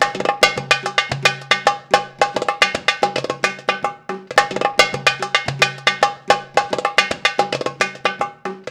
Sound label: PERLP, ArabianTraditions